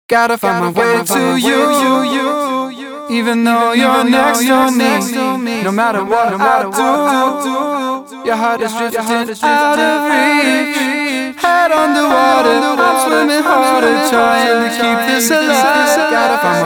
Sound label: vocal delay no eq